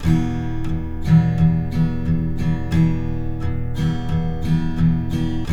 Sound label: TK MIN F, Guitars; Spectrasonics; Hans Zimmer Guitars VOL-1